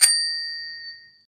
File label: bell